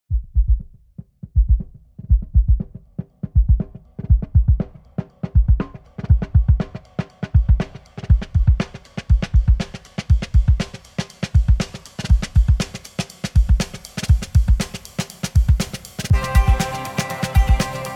Upbeat 12 String, Oud, Sitar

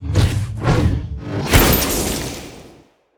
Monster; Zenless Zone Zero

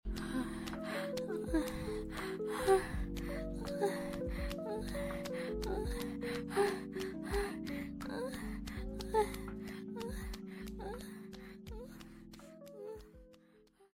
Moaning ASMR Lofi music Wet, Erotic audio; Sweet Girl Takes It Really Good; Good Girl Takes A Hard Pounding

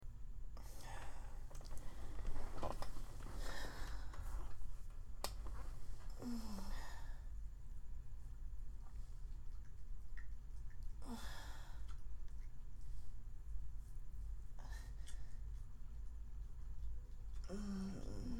much wet sounds, girlie noises; Girls Make a Mess of Me; multiple orgasms

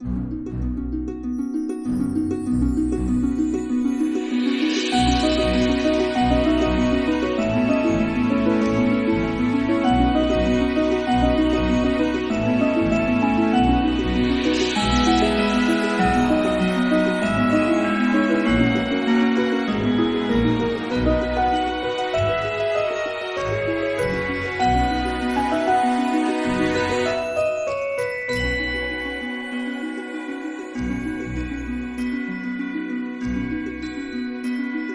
Instrumental

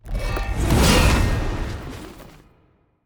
Monster; Zenless Zone Zero

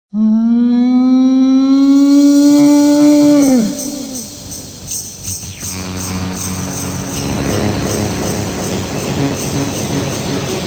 stuttering farting emo dinos with sax; Pony Music Archive; Far too much farting for a debut album

